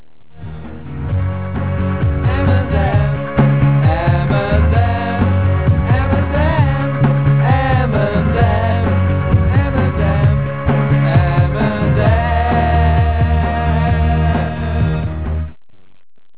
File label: song